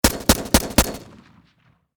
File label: Warfare Machine Gun Sig Sg 552 Commando Four Shots Close Perspective